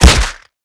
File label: punch hit player; weapons